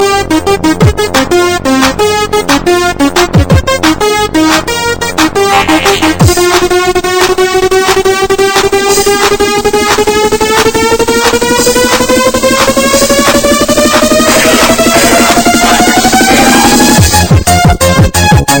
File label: Exciting Tune